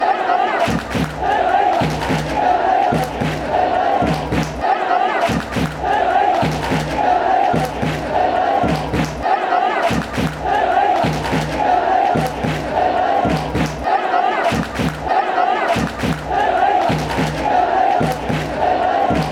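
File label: fanchants, football chants; eibar eibar rapida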